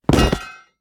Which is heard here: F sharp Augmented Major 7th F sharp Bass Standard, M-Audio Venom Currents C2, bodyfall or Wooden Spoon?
bodyfall